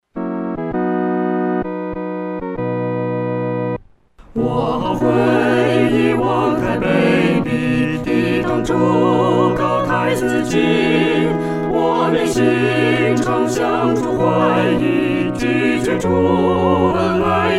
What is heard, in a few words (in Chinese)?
合唱 四声部; 何等痛悔